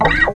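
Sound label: GUI Rutsch rauf, acoustic guitar; Best Service Gigapack 2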